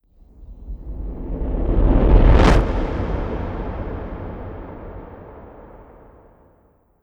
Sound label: fade to white